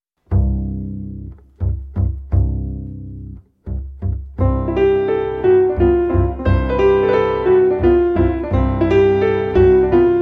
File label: chopin mazurka 56 2; pianister